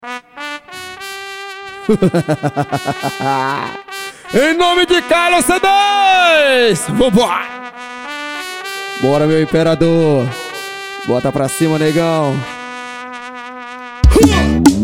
forró

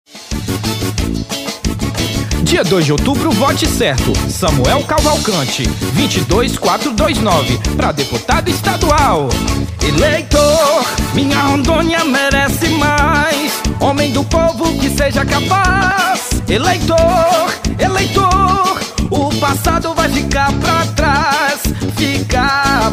Paródia Jingle Deputado, Jingles Politicos

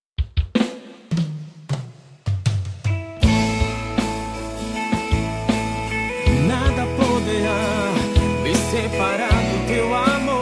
eu canto

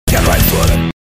o som mais rapido e pesado do mundo

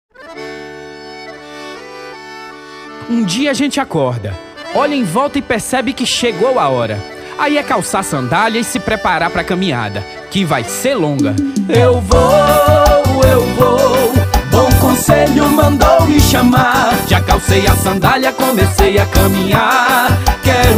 jingles politicos

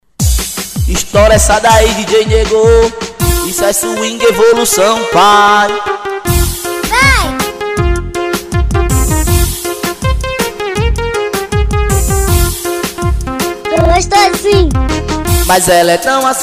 swing; evolucao nova

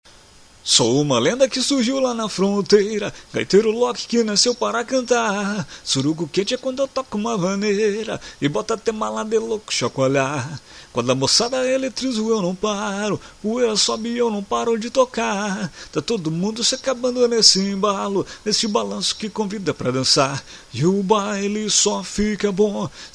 arrocha gaiteiro

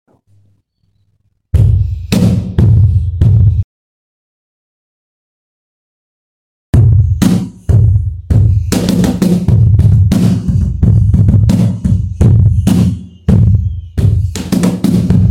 bateria

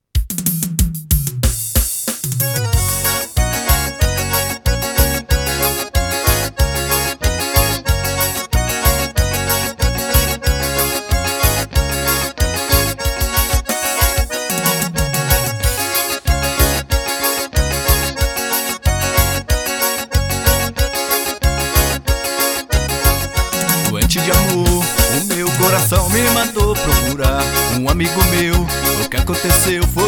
forro

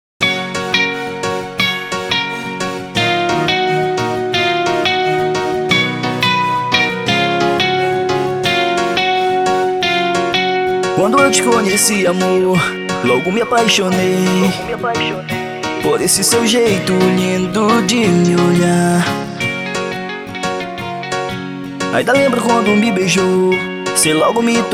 Acústico

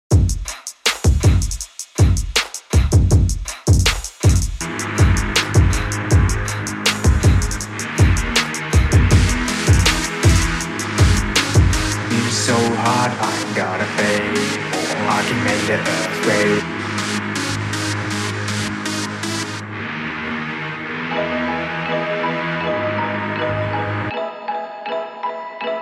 toque para celular base loka

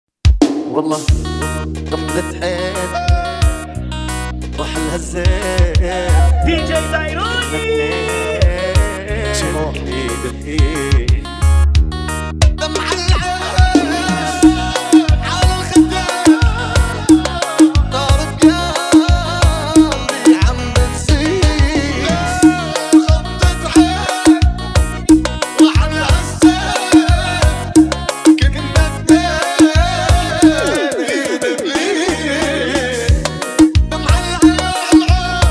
forrozinho arabe remix

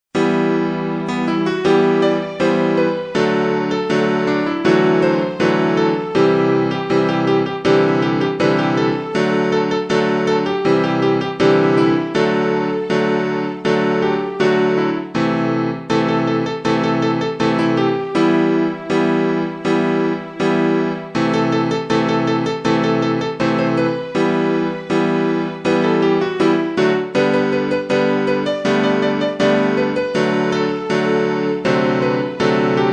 estrumental piano

